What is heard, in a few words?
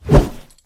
swing, hammer